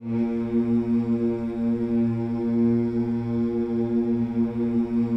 HMMS A#2, Vocal Planet Gospel; Spectrasonics; Vocal Planet Vol.1 Gospel; akai

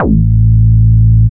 BASS

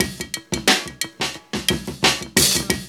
LOOP, DRUM; E-Lab; X Static Goldmine 2

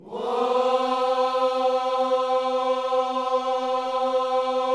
WHOA C 4, Vocal Planet Gospel; Spectrasonics; Vocal Planet Vol.1 Gospel; akai

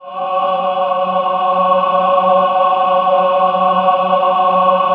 CHOIR; Best Service; Robot Computers

